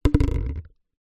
household small plastic halloween tub down on wooden floor